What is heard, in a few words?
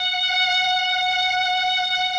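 MELLOSTR, Mellotron Violins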